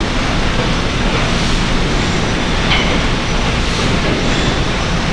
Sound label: Refinery Ambience